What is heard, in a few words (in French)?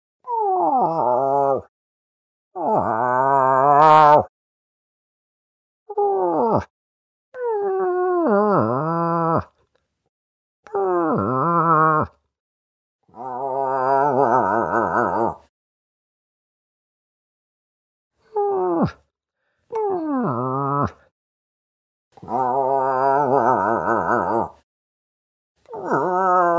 orignaux femelle non receptive